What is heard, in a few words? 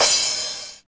909 CRA, TR-909